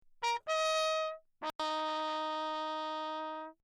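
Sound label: trumpet mute; Bach; Perinétventiltrompete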